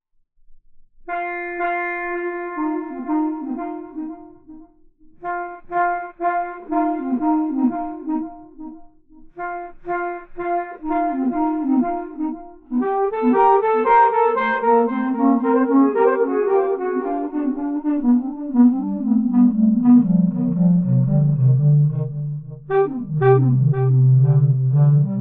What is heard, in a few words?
Street Clarinet Trippy